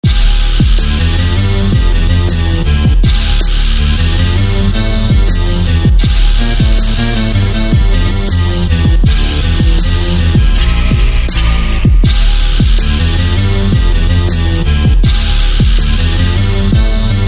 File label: Lakestorm Synth; SFB FX